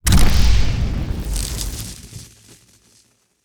ui 10 cards

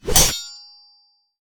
sword tsing

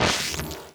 shock body

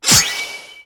gold sword

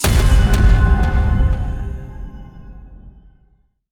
javelin; patriot

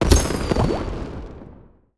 ui accomplish

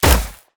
p atk vwgun

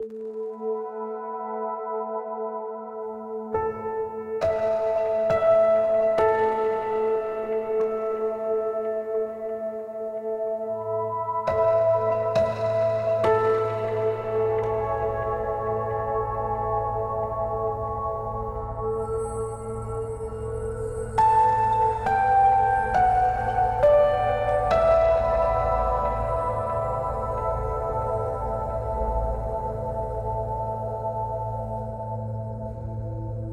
calm loop

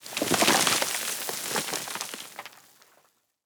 sandstone

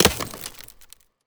mask break

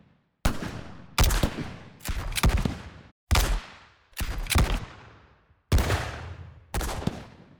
war fire loop

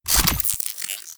mechanic weld

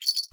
atk lox sword

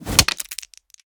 go stone split

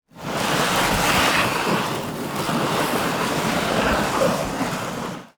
drag sofa

